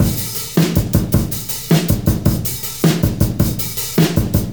106 bpm drum loop a sharp key; drum loops

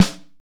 original snare f sharp key

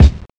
dry kick sample c sharp key, kick drum; kick drum samples

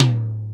high floor tom drum sample B key

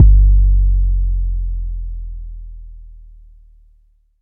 phonk kicks oneshot 808 G; kick drum

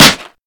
snare one shot a key

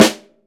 snare one shot a key

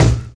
clean bass drum single shot a sharp key

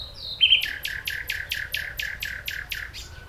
blackbird repetitive sequence; soundscapes

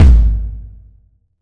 warm sounding kickdrum sample A key; kick drum samples

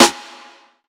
bright snare drum sample f key; snare drum samples

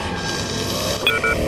dry beeps

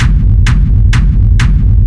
techno jumpy kick bassy click; drum loops

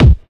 prominent kick drum one shot c sharp key

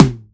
urban tom drum single hit c key

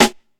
dry snare drum sound g sharp key

